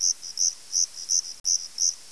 crick amb; quake2; chaos

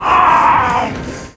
drown; quake2; blade

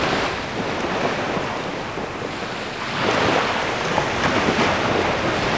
beach; quake2; cherbourg

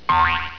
jump; quake2; cheese

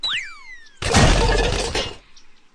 fall; quake2; strongbad

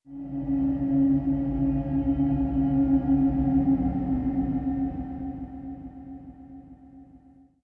eerie; quake2; chaos

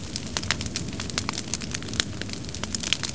fire; quake2; inland

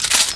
ssg bolt; quake2; weapons

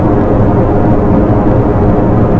giant atmosphere; quake2; zogg

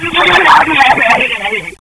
drown; quake2

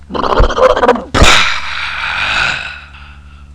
death; quake2; fangrin

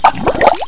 drown; quake2; sorb

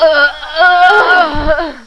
death; quake2; chastitymarks

